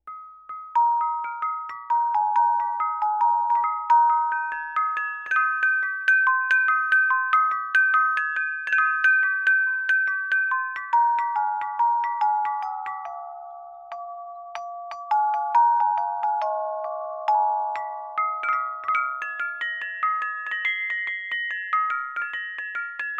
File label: kong tauch only